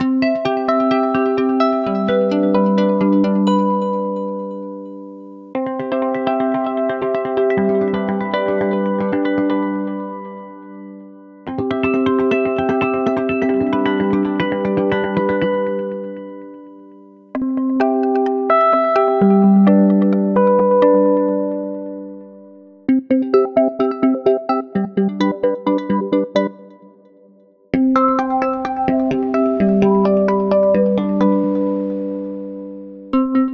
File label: Electric Arps